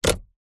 vehicles car wiper stick switch move flick toyota rav4; zapsplat